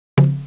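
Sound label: CONGA; CMI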